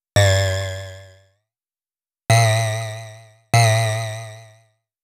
boing

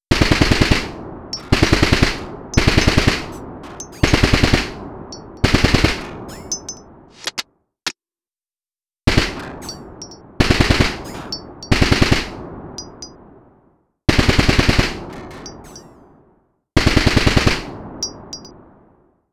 guns

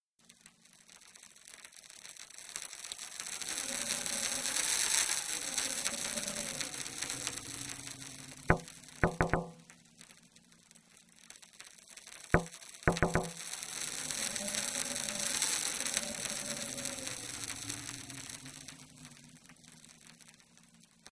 rainy window

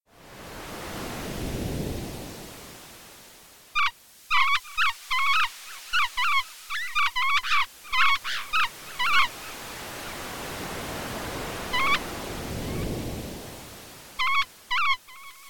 waterbirds

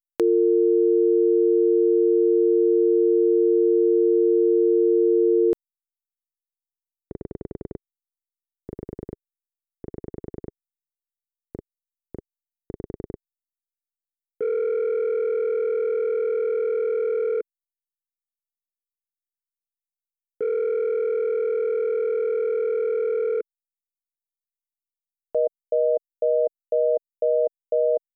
phone tones

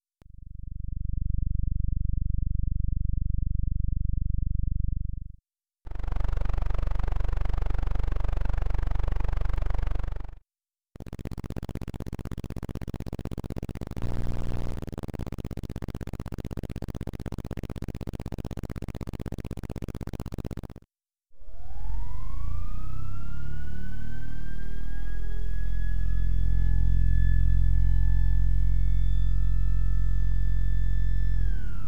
fans

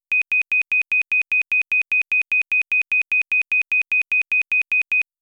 pedestrian beeps

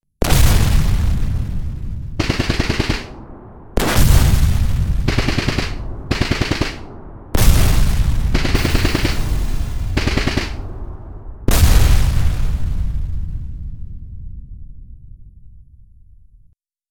explosions

